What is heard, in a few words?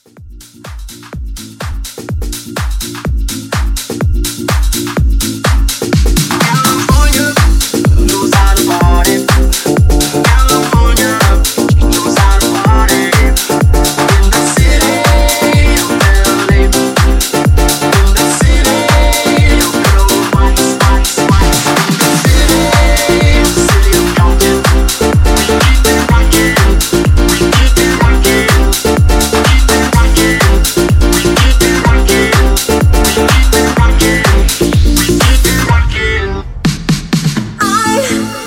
House 125; Mixshow Edit